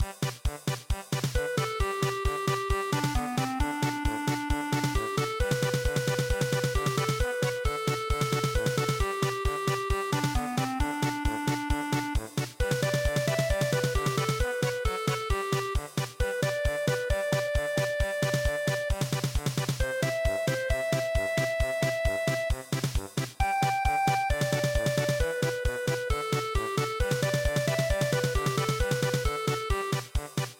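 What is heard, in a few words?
polka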